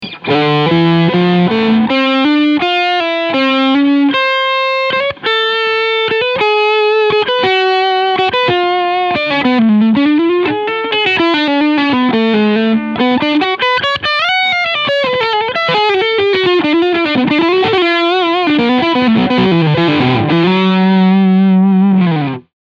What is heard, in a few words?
Traveler Guitar EG-1 Custom Overdrive Leadsound